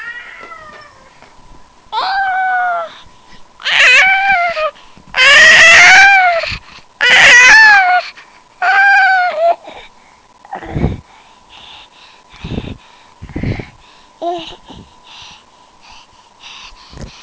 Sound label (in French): chante